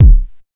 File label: JMP 130 BD, Big Beat Drumloops; AKAI; JUMP 130